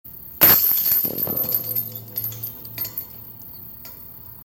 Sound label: Basket Chains